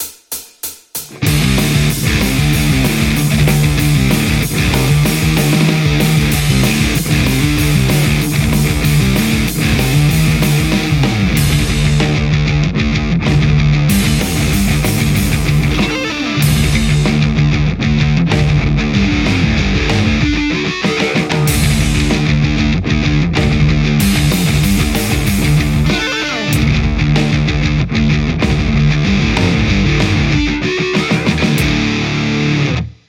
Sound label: metal strat; igniteamps; nrr1